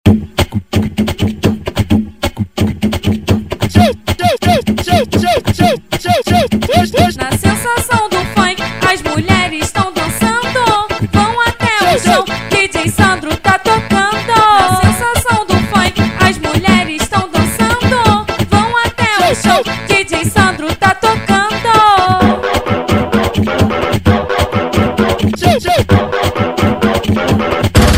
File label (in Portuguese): funk